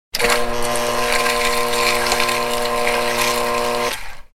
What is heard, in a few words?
water pump